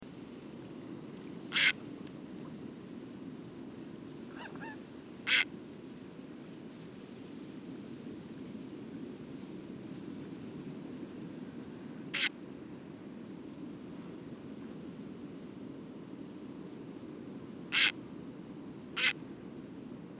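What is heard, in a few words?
ryzhaya caplya golos, purple heron voice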